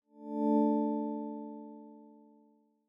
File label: notification ambient; Google; Material Design sounds